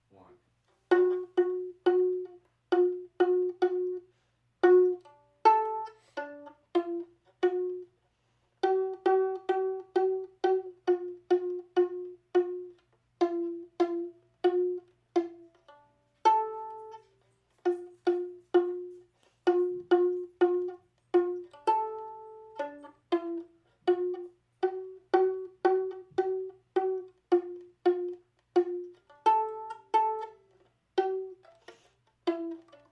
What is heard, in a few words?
Jingle Bells (violin); Christmas